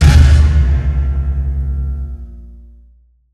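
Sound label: guitar acoustic hit processed; sonniss gdc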